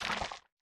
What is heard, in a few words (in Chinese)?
幽匿蔓延声